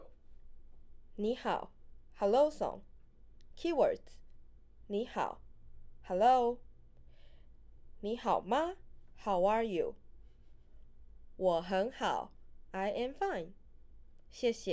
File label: Rituals Hello Song Mandarin